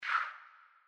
Dealer hand whoosh; Blackjack

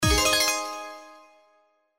Reel Spin Stop Special; slots; Majestic India

